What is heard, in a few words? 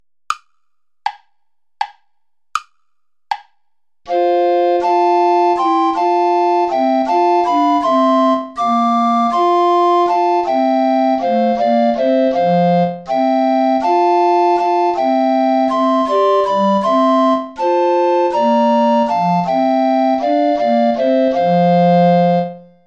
play along without tenor recorder